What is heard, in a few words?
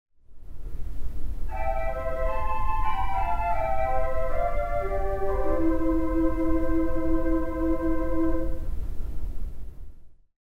Flute Celeste, theatre organ